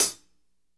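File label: hat closed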